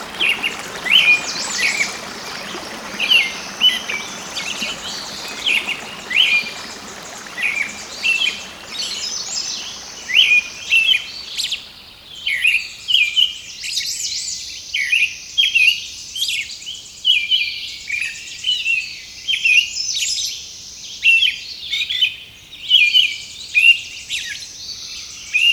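Zvuki prirody bez muzyki Pevchie pticy, nature sounds without music, songbirds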